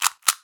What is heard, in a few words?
weapon